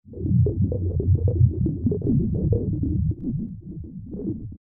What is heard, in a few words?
bubbling deep